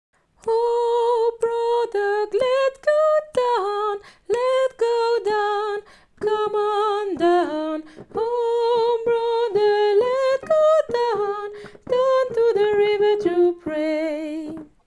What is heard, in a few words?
Down to the river soprane